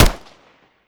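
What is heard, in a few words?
weapon fire player; weapons; gmod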